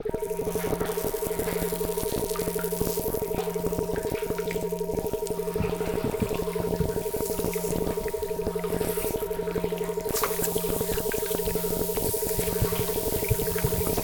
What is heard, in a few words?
Binaural Brain Wave Machine; maszkowicz; asynchronousart